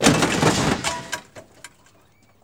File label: TRUCK JUN, Transportation, Truck Junk